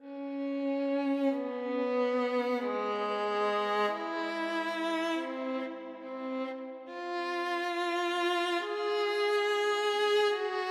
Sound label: violin